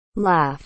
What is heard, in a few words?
laugh